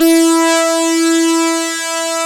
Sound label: GTR SYN LE, Gtr Syn Lead; E-MU Producer Series Vol. 2 – More Studio Essentials